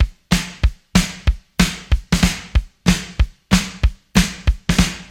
94 bpm drum groove g sharp key, Free drum loop sample - kick tuned to the G# note. Loudest frequency: 1343Hz